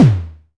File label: KIK, Pearl DRX-1